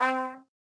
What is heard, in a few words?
C Trumpet